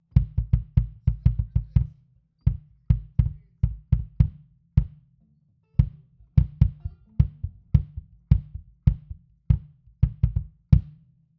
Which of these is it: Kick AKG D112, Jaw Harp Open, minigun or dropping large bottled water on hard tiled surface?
Kick AKG D112